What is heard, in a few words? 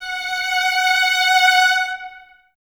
Violins Detache, F#5; Miroslav Vitous; 11 Violins